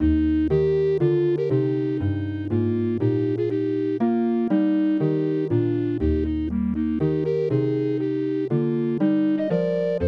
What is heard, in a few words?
minuet; macklenburg; Crawshaw ms